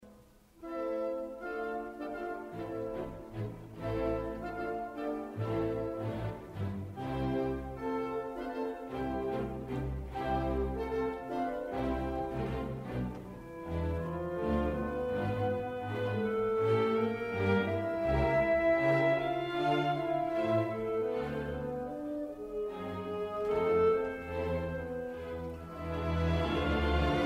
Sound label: Piano Concerto in A minor; Grieg; Op 16